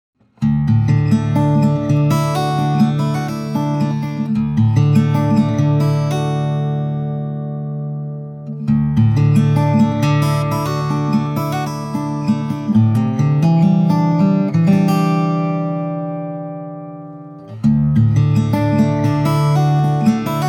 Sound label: Guitar